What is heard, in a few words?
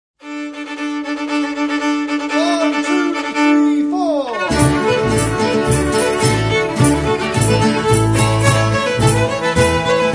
Ragtime Annie